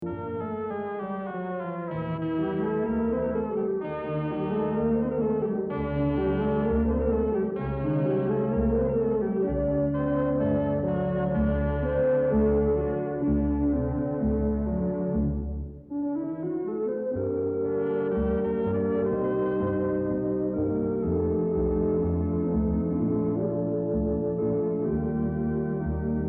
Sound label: clavioline